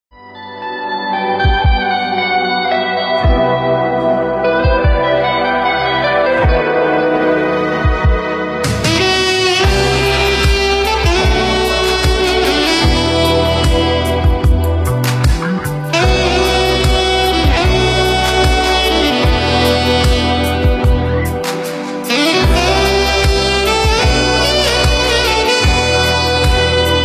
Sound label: Indie Pop